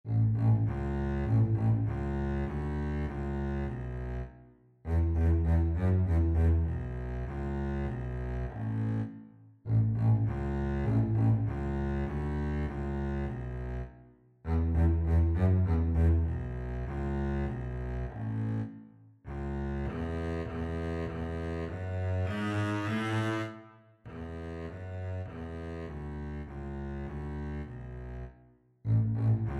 anile, double bass

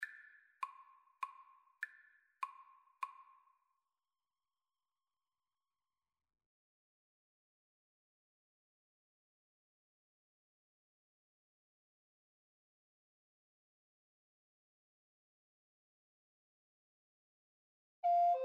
dona nobis pacem, Recorder Trio

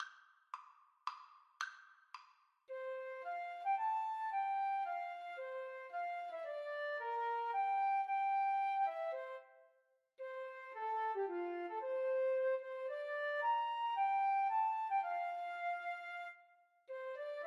Flute duet

